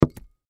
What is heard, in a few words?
Glass Cup Full, Glass Cup Full Set Down Wood Table; Dishware; Household; Blastwave